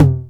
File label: 909 HITS; SINGLE HITS; Megabass Remix! 2